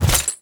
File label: metal small pickup, inventory; ui